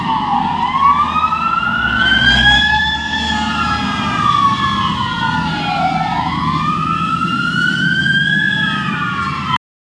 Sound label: siren; Нарастающий звук полицейской (милицейской) сирены